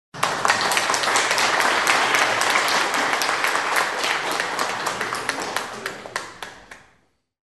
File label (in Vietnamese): Tieng vo tay khan gia